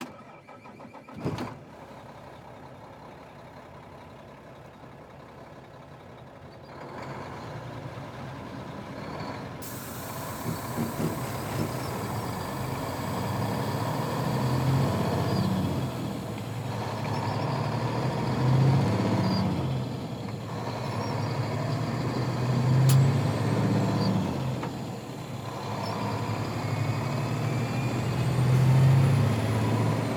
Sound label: Semi Truck Mac; Blastwave